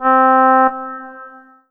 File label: Korg 01W Sweet Oboe C4